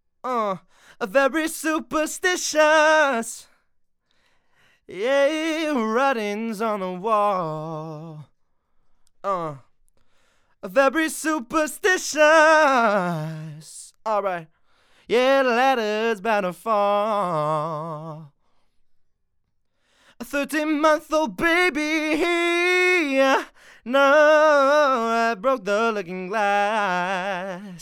Dry vox

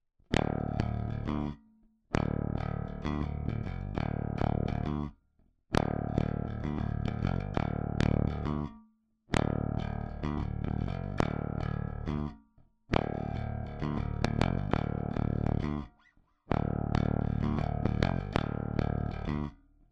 bass overloading

